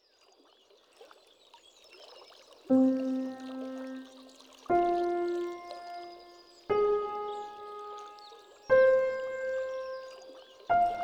Sonate No. 1, Opus 2 Allegro with Gentle Bird Sounds, Piano